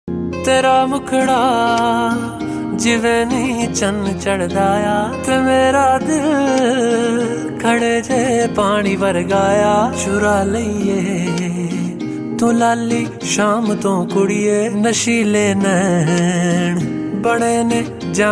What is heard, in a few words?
Punjabi Ringtone